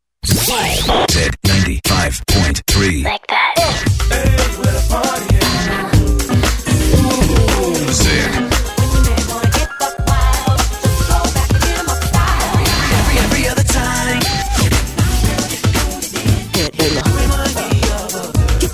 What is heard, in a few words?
Music Imaging Promo